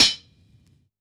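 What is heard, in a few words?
Vinyl Closed Hat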